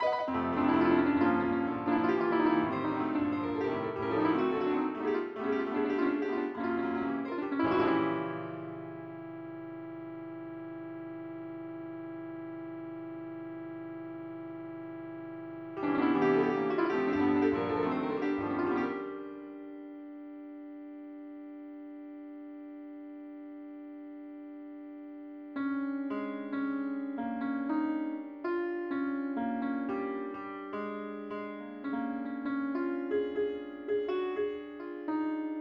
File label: piano